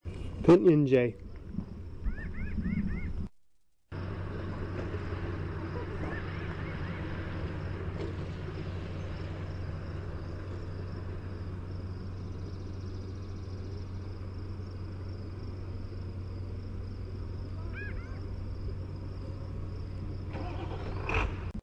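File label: Pinyon Jay rack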